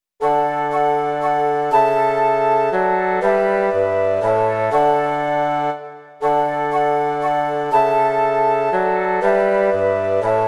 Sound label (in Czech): 2 flétny, lesní roh a fagot; Hned zrána děkuj; Imaginativní verze